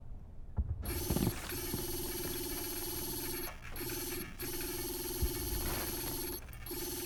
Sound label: rings